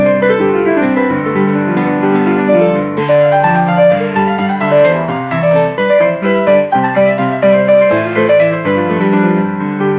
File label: crazy fast professional piano player; musiclm